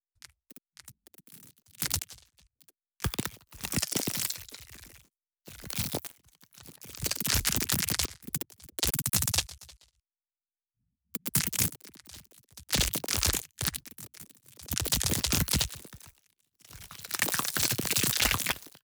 scintille

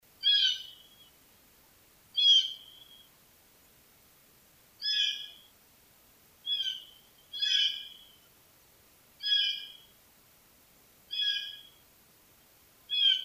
geais bleu

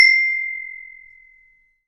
cymb aig

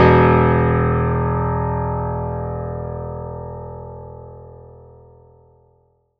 piano grave

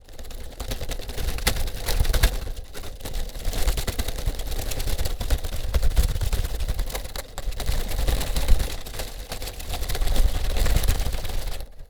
eventail

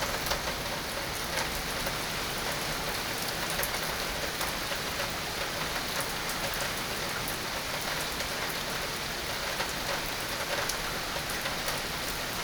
pluie dense

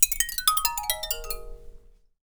xylophone desc

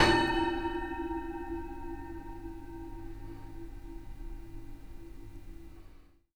grille resonance

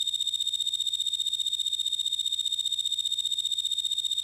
grillon

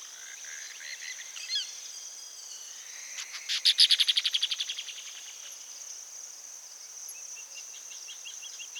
oiseau fache

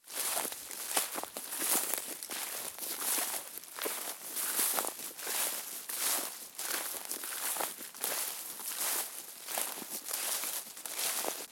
pas feuilles

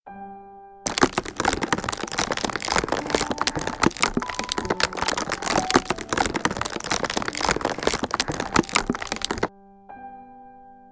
mel bruit

